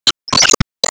Sigh; Disney Star Smash; Android, iOS, Mobile; Emo

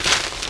Rustle; Picnicboat

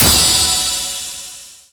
Enemy Fashion Heal

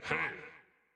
Aggro; Going Under; Dinkubus

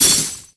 Bigbang Crack; gamerip; SE Bigbang03 Crack; puyo puyo puzzle pop ios macos mobile gamerip 2024

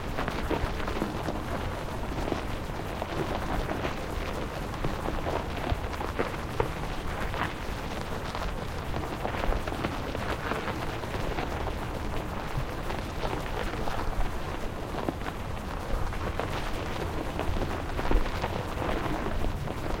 Cruiser Rolling; lethal company

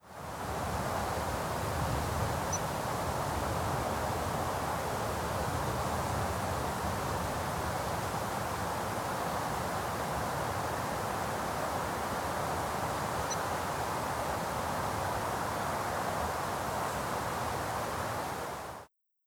Atacama Wind

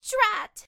Time Over

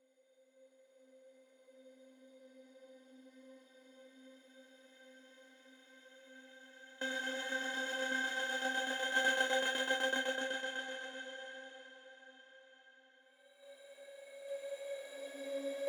BUS 4 PADS

